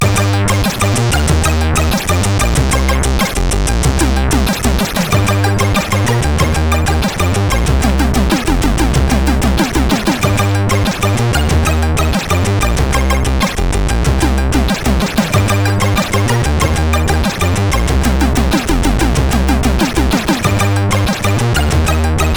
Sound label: intro, CSG8580R5